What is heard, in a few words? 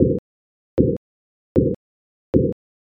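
footsteps soil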